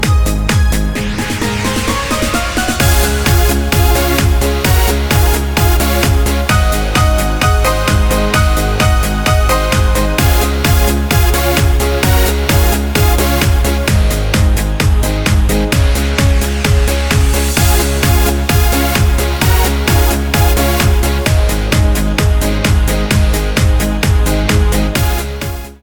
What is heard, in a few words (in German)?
Geburtstagslied Karaoke, Instrumentalmusik; 70. Geburtstag